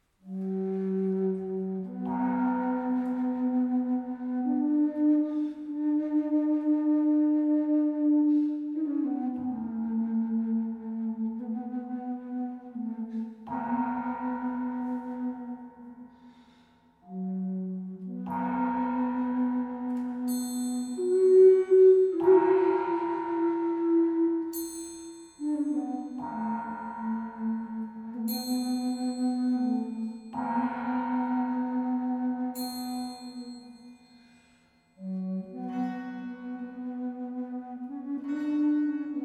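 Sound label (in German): umgangssächsisch; De Lidertrun; Hegt wird gesangen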